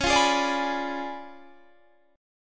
Db 7th Flat 9th, Guitar, Standard; Db7b9 strummed; JGuitar

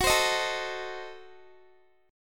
Gb Diminished 7th, down, Guitar; Gbdim7 strummed; 4E,4A,5D,5G,5C,6F

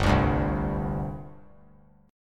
G Suspended 4th; Gsus4 strummed; JGuitar

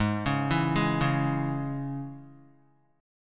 Ab Suspended 4th Sharp 5th, Guitar; 4F,4A,5C,5G,5C,6E; arpeggiated; JGuitar

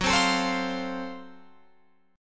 Gb Minor Major 7th Flat 5th Gb Guitar Open F; GbmM7b5 strummed; 18,19,21,19,20,19

